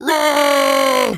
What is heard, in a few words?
roar; Crypt of the NecroDancer